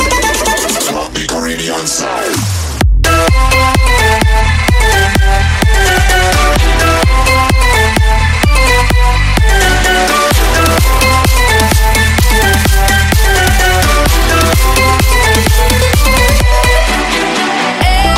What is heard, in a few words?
caribbean rave